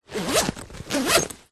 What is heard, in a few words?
luggage camera bag zipper; zapsplat